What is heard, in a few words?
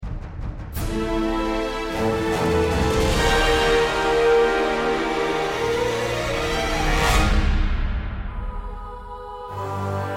cinematic game end; hero of sparta; iphone